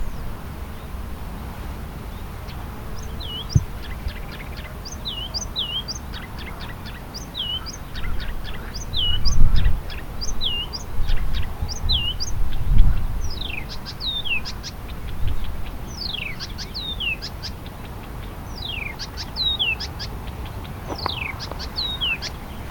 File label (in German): buschrohrsaenger